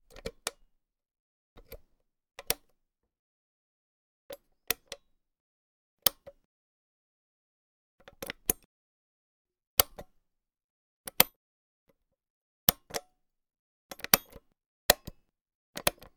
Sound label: switches; devices accessories utilities; NS Studios - ultra sound collection